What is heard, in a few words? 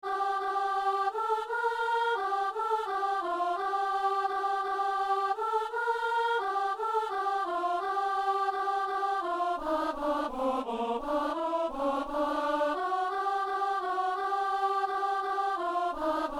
alto; Chrétiens, chantons !